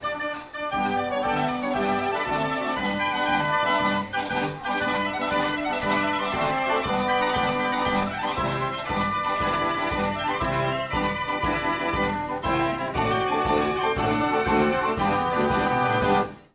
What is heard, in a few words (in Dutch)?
Draaiorgel